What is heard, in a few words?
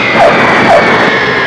WHEEL; Transportation